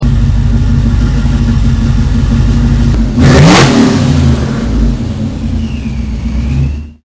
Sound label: engine